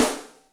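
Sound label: Open Rim D, Acoustic Snare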